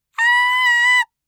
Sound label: kyaa; gaya